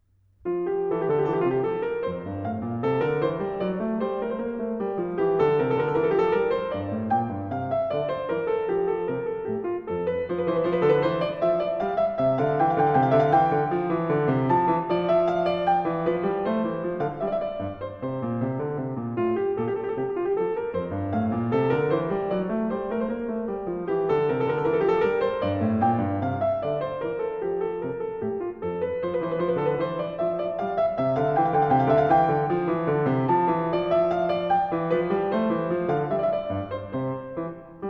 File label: English Suite in F Major, Menuett; English Suite No. 4; BWV 809; Bach